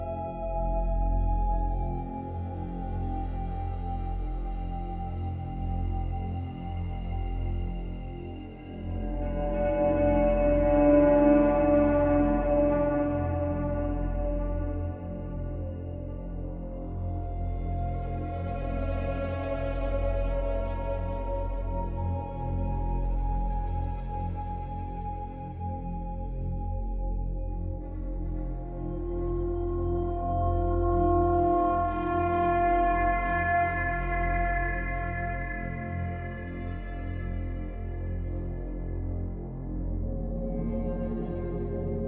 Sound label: Orchestra; Moulton's Hill; Assassin's Creed III